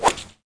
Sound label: Swing Normal, golf; Pangya; Fantasy Golf